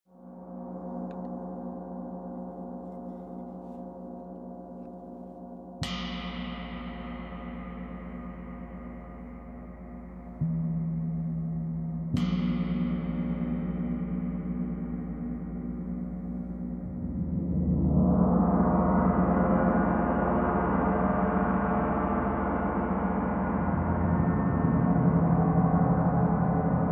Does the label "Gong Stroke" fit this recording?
Yes